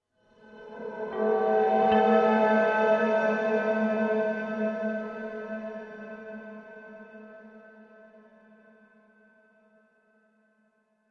Guitar Chord Musical Magical Reveal Swell Transforms; Guitars (Sound Effects:Musical)